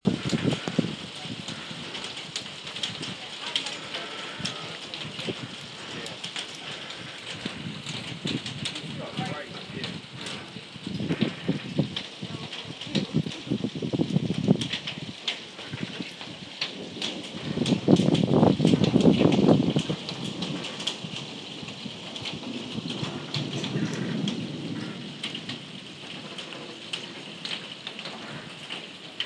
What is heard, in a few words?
nice bit of thunder